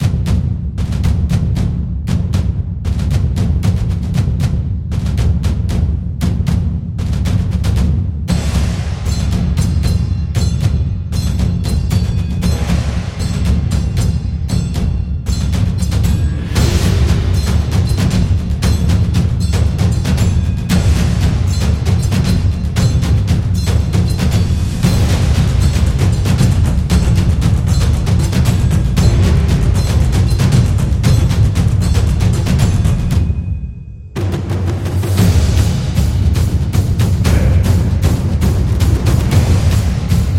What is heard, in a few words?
Japan Taiko